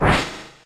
Corkscrew Crash; pokemon reborn e19 2022

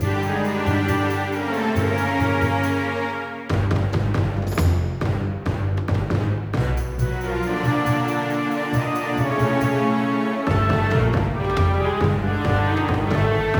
Tension Loop; Might and Magic Clash of Heroes

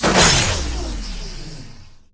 Evil Spawner Destroy; Candy Crush Saga

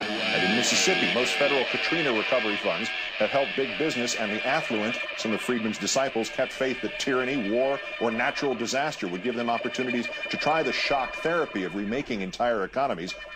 Vocals; PixelJunk Shooter; PS3

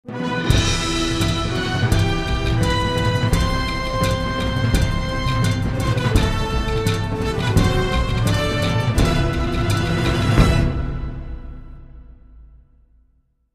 victory theme; Sacrifice

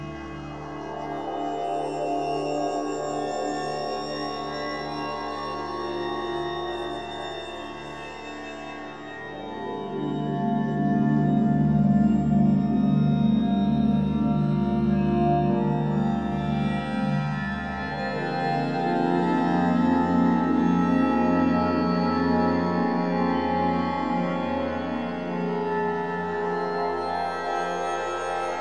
Menu Pause loop; Transformers Dark of the Moon Stealth Force Edition

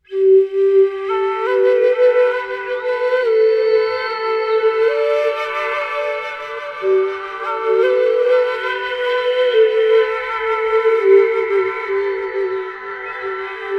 strange indian flute stinger

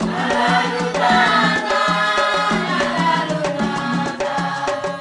Sing

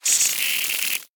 Warrior Acid Attack prepare; we are the dwarves gamerip 2016

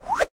UI Menu Transition Between Pages

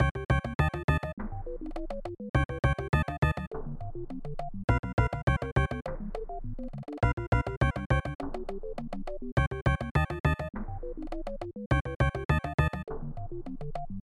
Tension in the Ice Cave; Joe Mac 2 Lost in the Tropics; snes

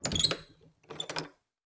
Door Open

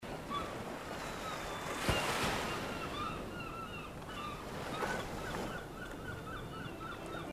Island Beach Environment; U.B. Funkeys

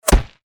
bullet impact dirt; Out of Reach: Treasure Royale

